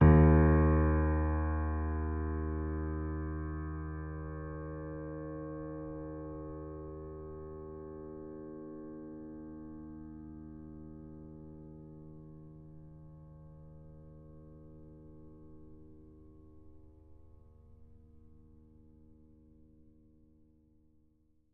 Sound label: D sharp 3